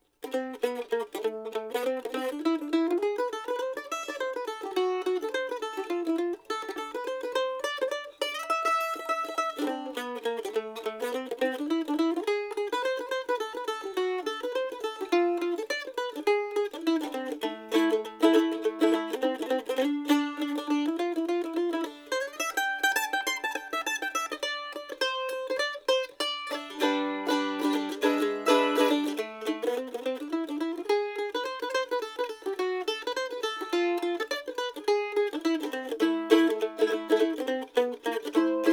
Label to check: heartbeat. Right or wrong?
Wrong